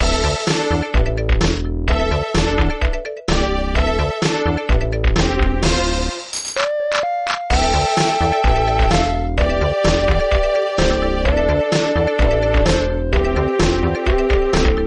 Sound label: bonus stage chiptune; sf2 sfc original chiptune